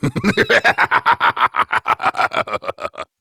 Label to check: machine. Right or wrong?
Wrong